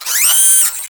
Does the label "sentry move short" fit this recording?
Yes